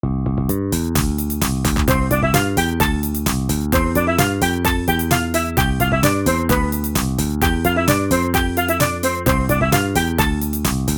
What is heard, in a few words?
Bad Synth Beach